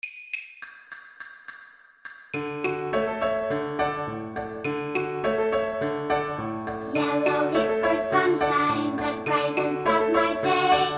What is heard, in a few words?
recorded choir